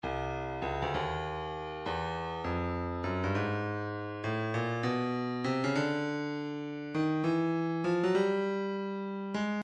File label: percussion midi files congas 100 congas bend; Stylus